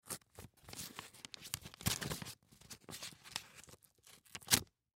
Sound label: Envelope Open; Letter Opener; Office; Blastwave